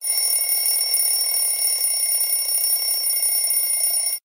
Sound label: alarm clock